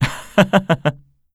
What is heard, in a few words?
laugh; Eternal Return; KOR